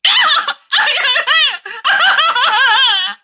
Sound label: vox death cry